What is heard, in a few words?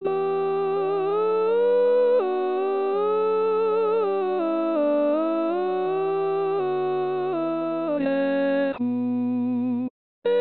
barechu playback